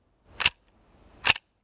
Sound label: Lever Action